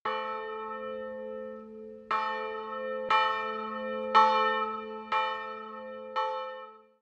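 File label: Bell A1, electronic bells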